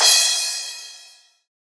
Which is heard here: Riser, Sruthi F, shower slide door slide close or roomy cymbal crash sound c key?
roomy cymbal crash sound c key